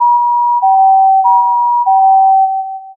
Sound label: ambulance